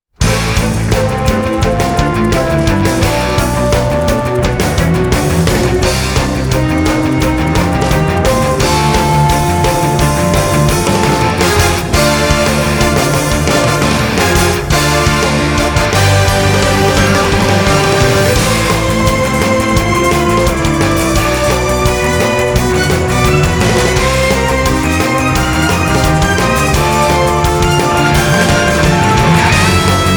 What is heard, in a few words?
Battle BGM